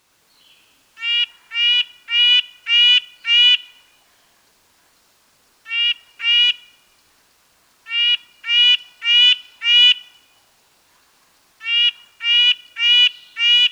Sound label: red breasted nuthatch